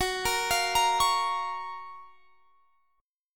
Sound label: F sharp Major 7th, guitar